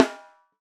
12x8 Snare Sm57

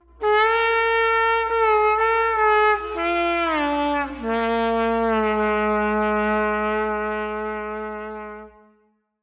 A Sinful City

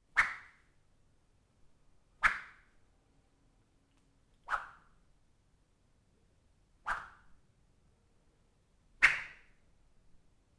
Whip Horse High Attack

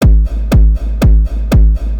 Beat

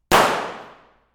Gunshot Stage Pistol; Soulpepper

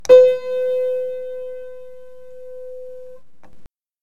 Piano

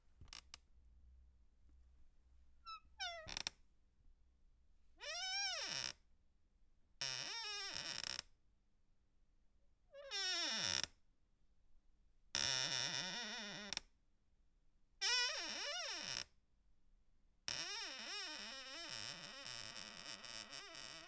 Squeak

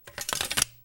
Sword sheath

